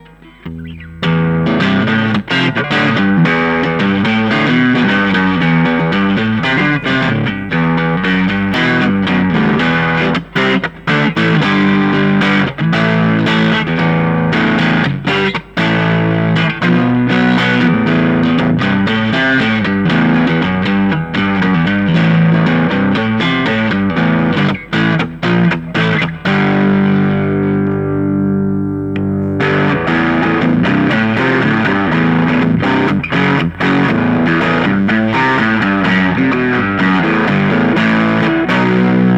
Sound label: Overdrive